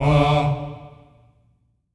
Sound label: Staccato F D3 L, Symphony of Voices; Spectrasonics